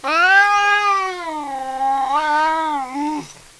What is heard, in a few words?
cat alert